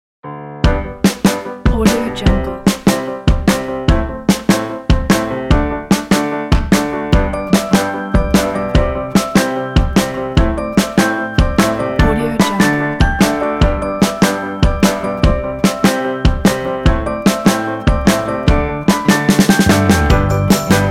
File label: happy fun dance